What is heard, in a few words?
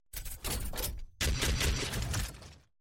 sentry